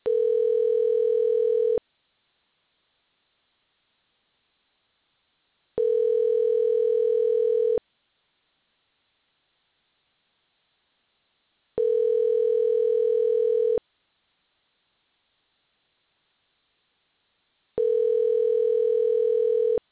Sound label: Ringback Tone